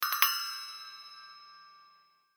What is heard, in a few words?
Musical Cymbal Taps High; Cymbals; Blastwave